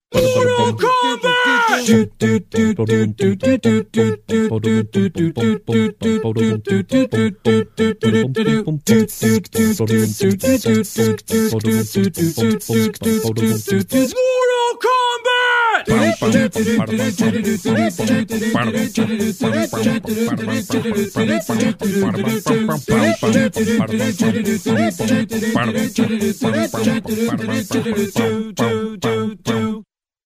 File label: acapella